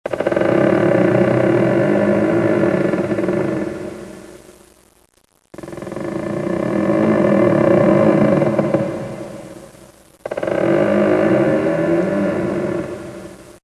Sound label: Creaking Door